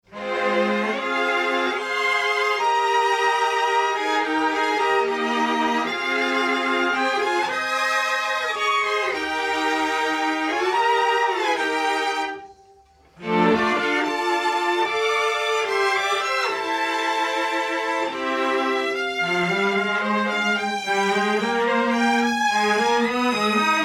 Mix Strings Bus Limit On

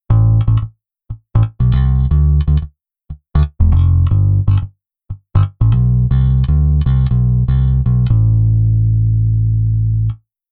bass 16 swing off

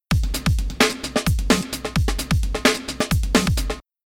Rhythm Breaks Loop Add Midi

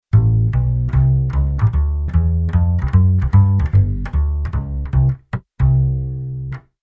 Bass Arr Jazz Bass

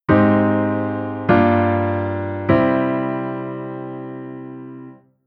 Chord bVImaj7

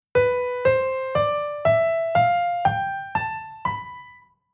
Chord mode B Locrian

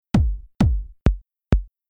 2Step Kick, Rhythm

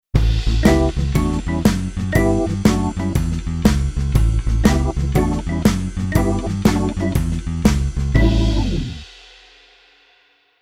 Keyboard Organ Blues

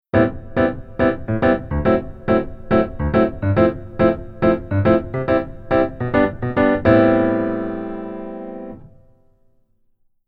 keyboard rhythm

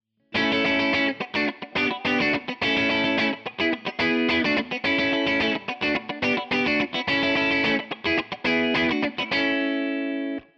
guitar Cutting

